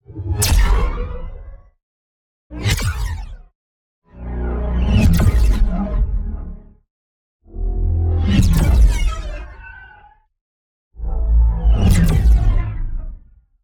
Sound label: Weapon Scifi Blast Big Sequence Stereo